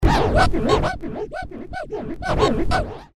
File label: Turntable